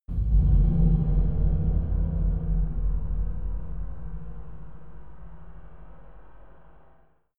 scary metal chime; impacts

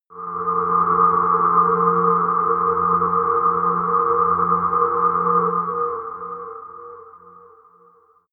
sci-fi quiet planet, drones

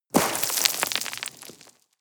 step gravel slip down debris; schritte; menschen

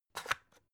electric whisk slider close; haus-garten; kueche

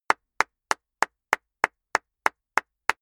clapping medium fast; comedy-cartoon; koerpergeraeusche

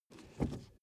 suv 1st gear release; verkehr transport; autos

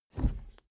suv pull back gear straight soft damped; verkehr transport; autos

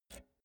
pull out jack multi socket; objekte; elektronik

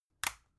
magnetic plug; objekte

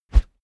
whoosh wood cornered whizz; swooshes-swishes

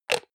hygiene small cup close hard, badezimmer

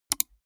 gaming mouse left click single fast soft; maschinen; buero

